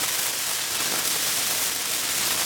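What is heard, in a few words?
Weapon flare gun projectile loop; Wpn flaregun proj lp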